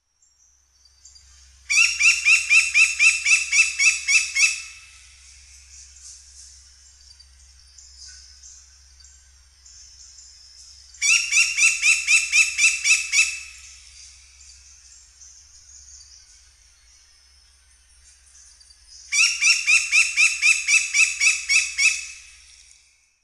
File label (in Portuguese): canto do pica pau de cara canela